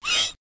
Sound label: bat miniboss death; Crypt of the NecroDancer